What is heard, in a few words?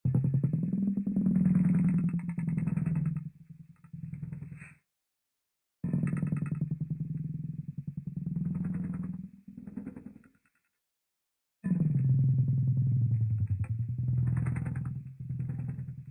motor running muffled